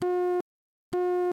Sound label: Beep